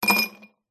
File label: pub ice place in glass; field reports